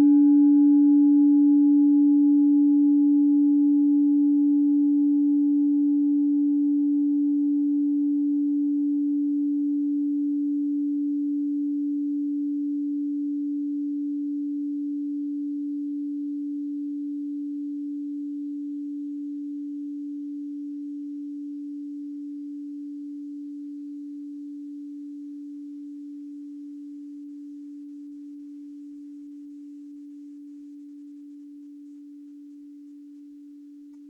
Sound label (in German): klangschale set; Gewicht = 730g; Durchmesser = 15,5cm; Höhe = 7,8cm